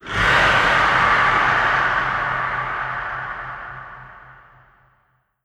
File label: antlion distant scream; creatures; Half Life Alyx